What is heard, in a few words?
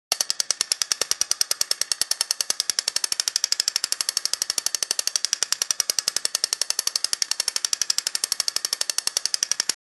bullet bike running sound